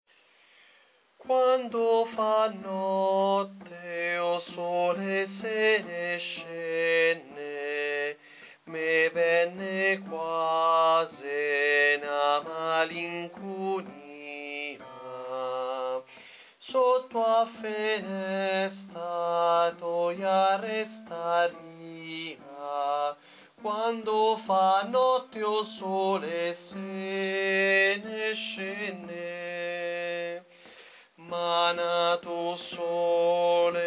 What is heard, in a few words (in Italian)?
bassi; Coro Isola del Giglio